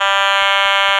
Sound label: Otamatone; Ableton Foundation FreePacks; Foundation Glitch Toys & LoFi